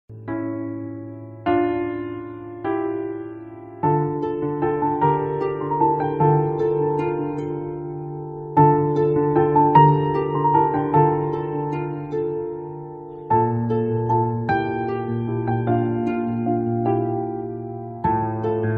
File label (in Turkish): Enstrümantal fon müzik